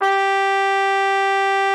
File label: G4, TRUMPET; Xsample VOL-3